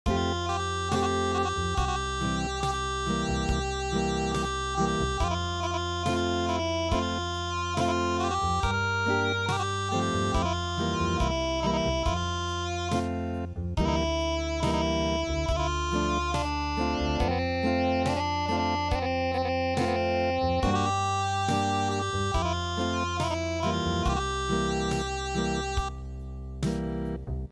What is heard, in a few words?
Acoustic